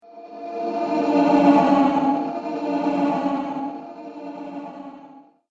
Throbbing Ghost Pulse; Sinister, Scary, Spooky Or Just Silly

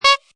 Cartoon Bike Horn Single; Comedy/Cartoon BIKE HORN: Silly horn toot, 4 blasts; Funny Sounds; Sound Effects:Comedy; Lynne Music

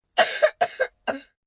Cough Cold, 1 child, several, dry; Sick; kid; human voice

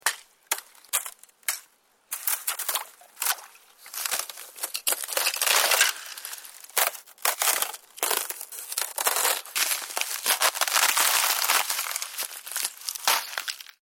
Breaking Ice, Smashing holes in frozen water.; Snow; Weather

